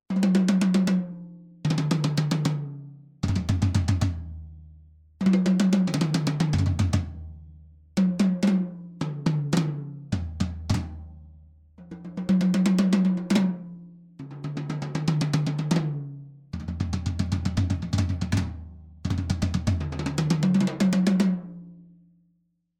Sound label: Fame Crystalicca TOMS